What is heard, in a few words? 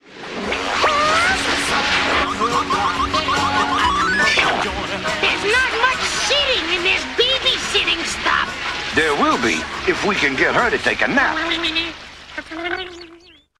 Short music sample of a cover song